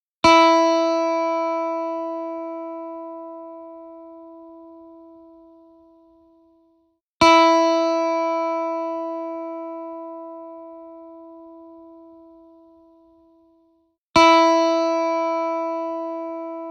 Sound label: E String high